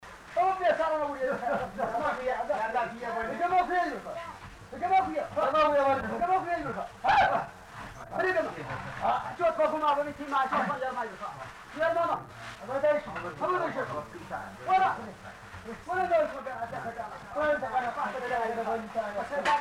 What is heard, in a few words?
gurage work chant, ethiopia